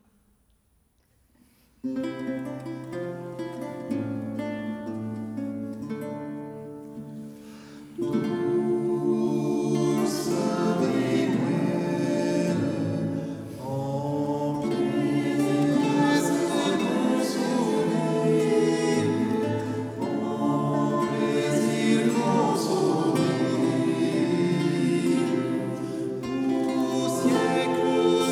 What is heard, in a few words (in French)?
ensemble vocal accompagné d'un luthiste église; aleph 16; st vincent; doulce memoire